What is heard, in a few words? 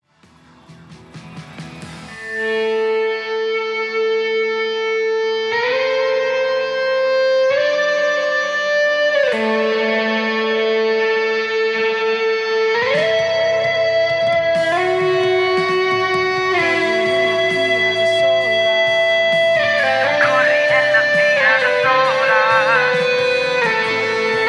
EBOW